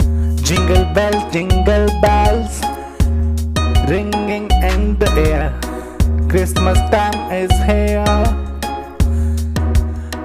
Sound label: Jingle Bells Kizomba